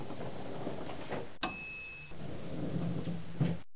lift; Mr. Wonder's Greatest Toyshop on Earth; theshop

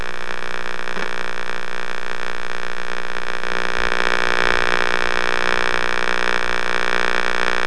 estatic

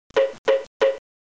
hwalk; McDonaldland - Silly Games; McDonald's; snakes

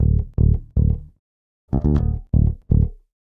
bass loop, Rock; ACID MUSIC

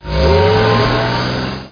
truck out

